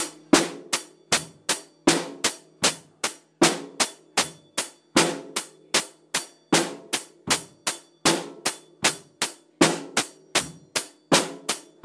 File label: teaching to play drums